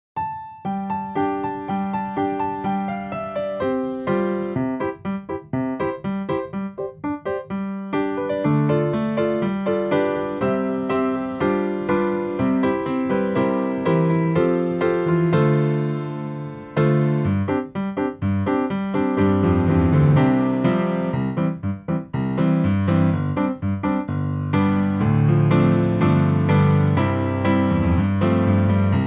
happy all the time backing

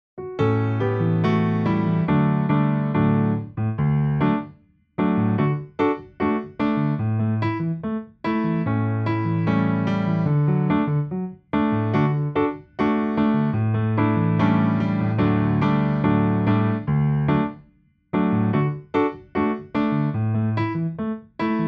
shake a little hand backing; Engelsk

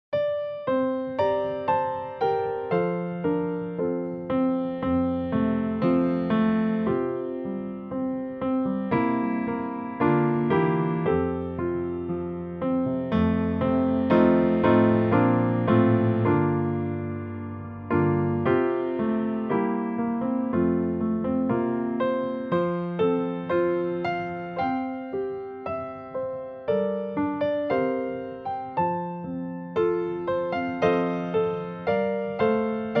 god sees me backing

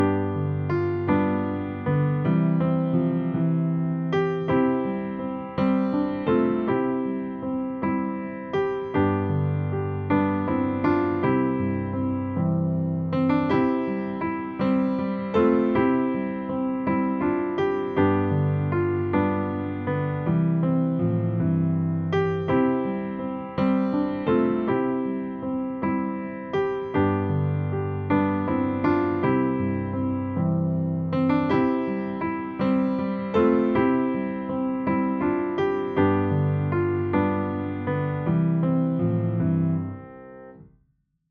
the flowers are gently nodding backing

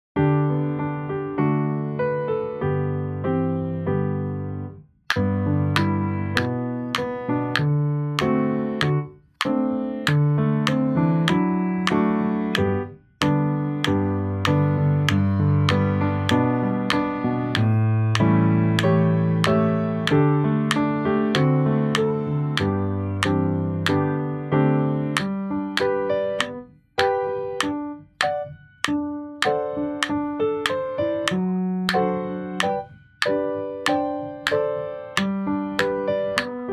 tick tock backing

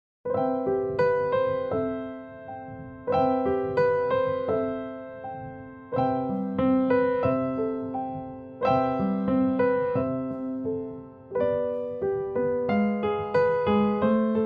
woolly woolly lamb backing; Engelsk

